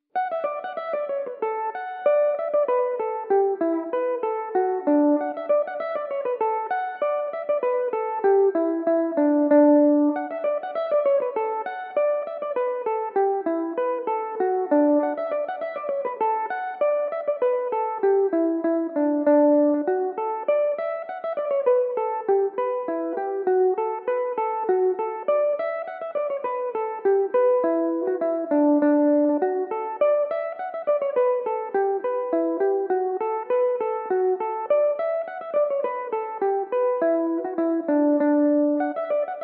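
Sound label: D major, Irish mandolin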